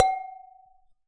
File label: Tap Glass, Medium Wine Glass